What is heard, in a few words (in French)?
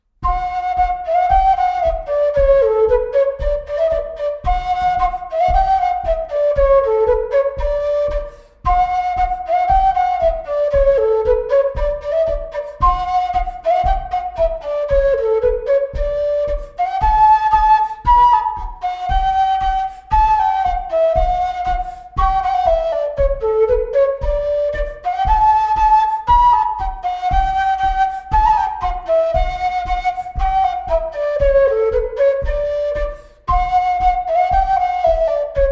Bourree